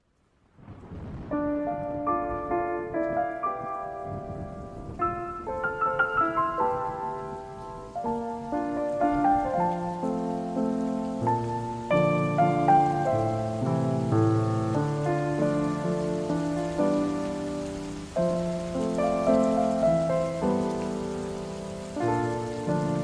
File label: Heavy Rain Lofi HipHop